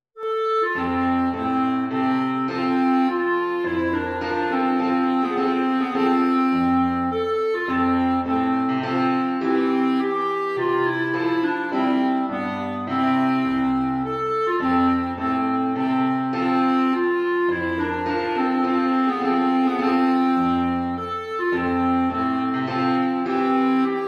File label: clarinet Minuet and Trio; Minuetto; Minuet and Trio D.41 No.21; schubert